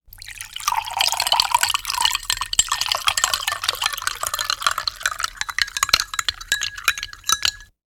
food drink stirring glass of water while being poured; sound effects one